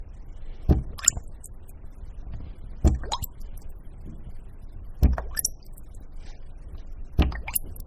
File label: beer bubbles